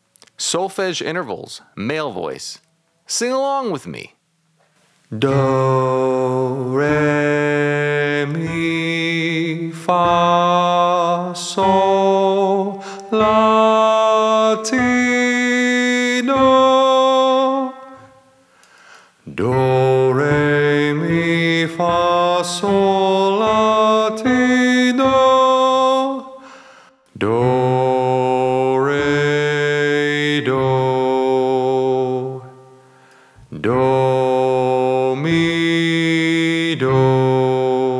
Solfege Interval Training Male, vocal warm up, singers